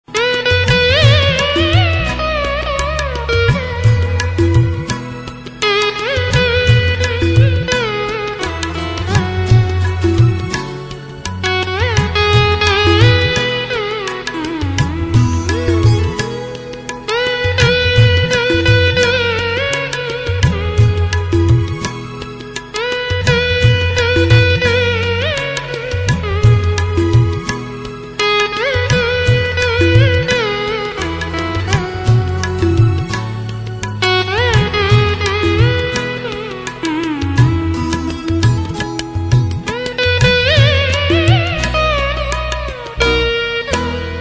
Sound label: Hindi Instrumental